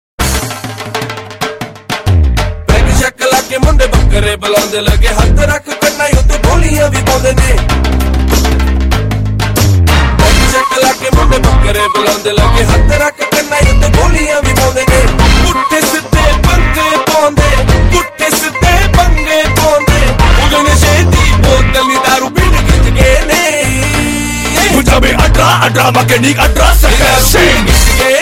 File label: Funny Indian Music